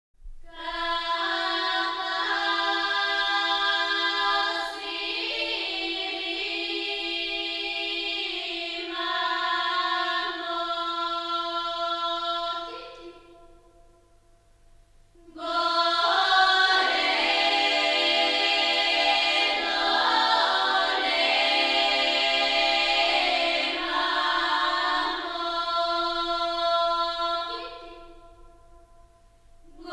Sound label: Kaval Sviri The Flute Plays Female Vocal Choir